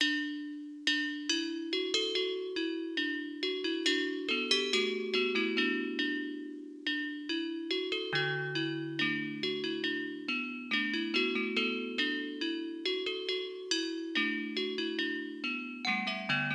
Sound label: Pacific Islander Gamelan tune; anno 1503 gamerip